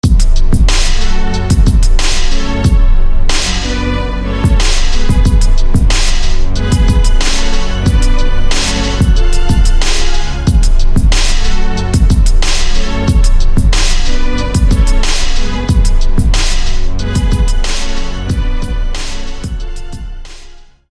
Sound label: Strings; Grave; SFB FX